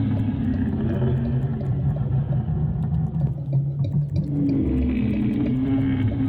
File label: cutholoids idle; Stellaris; grand archive; ships